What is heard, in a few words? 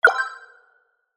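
Daily Surprise Result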